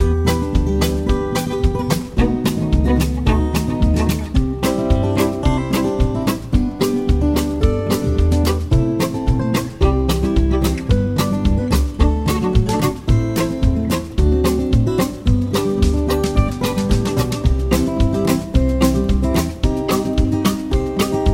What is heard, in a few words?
Train Beat Fingerstyle; Country